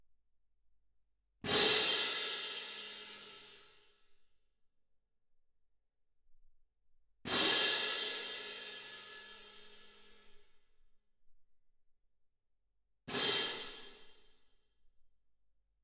Twin R Perc

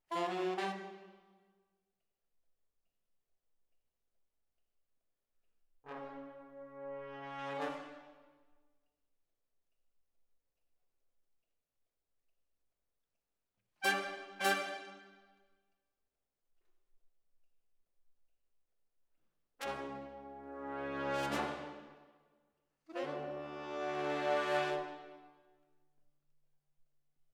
ORTF, Jazz for piano

